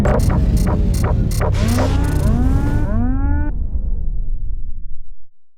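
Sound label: die, alien controller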